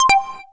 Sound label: camcorder end musical, camera sounds; Samsung SGH-i310